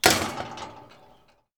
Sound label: small gate shutting